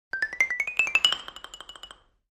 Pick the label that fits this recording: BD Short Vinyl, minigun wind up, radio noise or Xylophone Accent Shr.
Xylophone Accent Shr